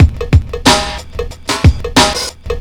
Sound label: LOOP; SoundFactory; DRUMLOOP